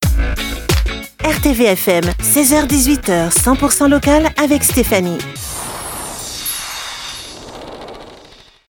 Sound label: Jingle